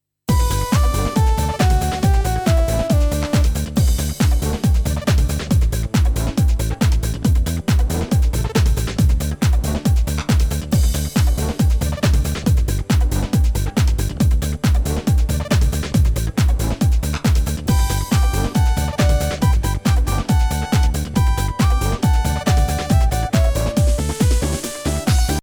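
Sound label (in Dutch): poetslied instrumentaal refrein nieuw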